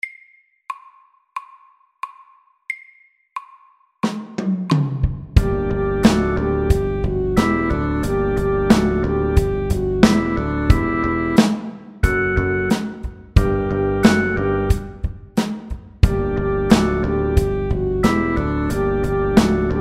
Rocky poppy sax SAKTE

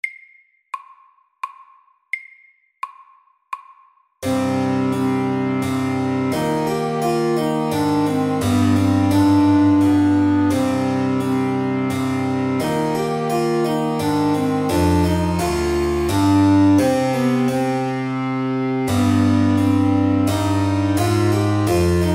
Menuett SLOW

